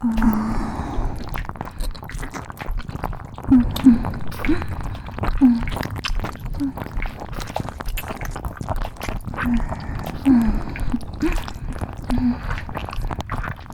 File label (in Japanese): 両耳舐め; 抱き着きキス騎乗位搾精